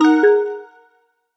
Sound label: accept